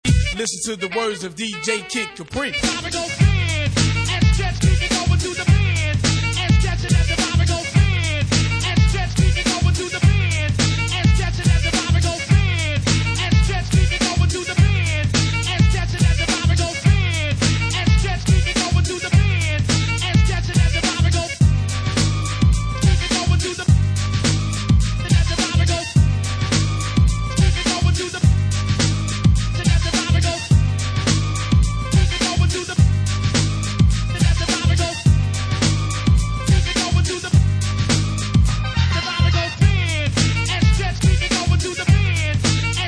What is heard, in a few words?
party groove (bass mix)